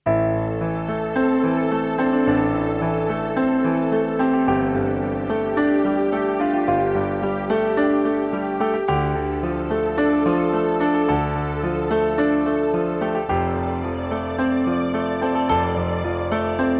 underwater piano

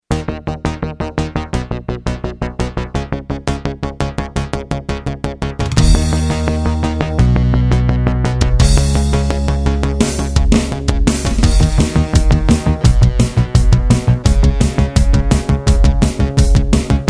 blast analog

